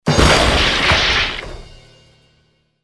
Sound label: Wood Joint Break; bad piggies; android; mobile; windows